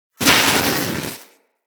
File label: Smithy Forge Fire Coal Burst; Soul Plane